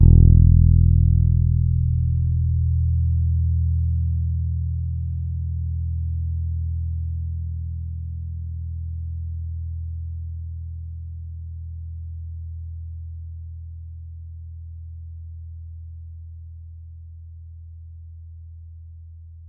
E2 soft, Pedulla Thunderbass, Bass; Giga Samples Collection

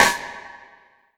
SN, drum machines

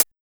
909 TEK CH; Drums In Your FACE!; BONUS HATS

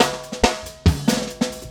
LOOP SD, Drum & Bass, 140 BPM

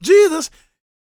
gospel male, vocal; ILIO; Vocal Planet

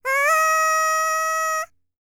BULGARIAN, World Voices, BALKAN; ILIO; Vocal Planet

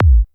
Synth Kick; Drum & Bass

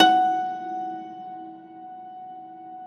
Rubbery Piano, prepared piano; Prepared Pianos; Total Piano; Rubbery Piano 2 +ped

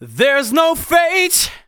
vocals sung F#; Techno Trance Essentials

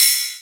Rides, Cymbals

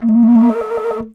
moc li, MOCENO BASSFLUTE LICKS; PS-52 WORLD INSTR 2; WOODWIND AND BRASS

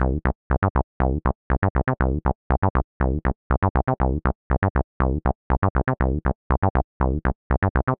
120 Cm bass, bass loops, trance

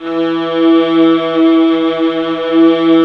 viola, 3D Viola Xtreem; E-MU Producer Series Vol. 5 – 3-D Audio Collection; 3DSynths

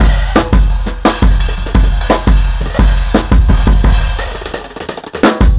Fill Beat 4-Bar, Drum n Bass; KJ Sawka - Drum n Bass & Beyond; Beats and Percussion

